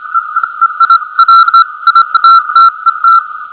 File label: morse